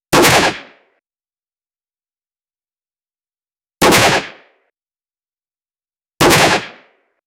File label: three gun shots